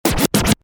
Scratch, Record, DJ; Musical; Stuart Duffield

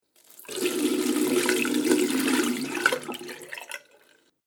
Water Pour Down Sink; Pouring Sound Effects

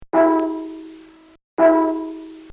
Cartoon Sound Effects Bongs

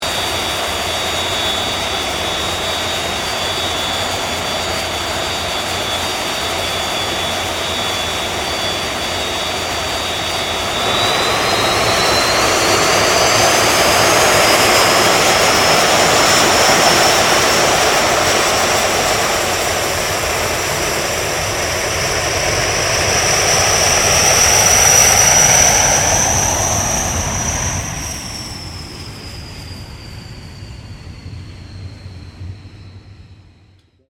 AIRCRAFT CA-27 SABRE TAXI; CA-27/F-86 Sabre; Airplane; Airplanes; Vehicles

